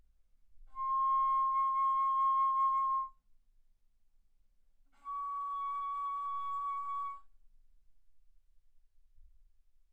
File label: Bass Flute ff C6 Db6